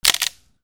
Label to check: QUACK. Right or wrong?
Wrong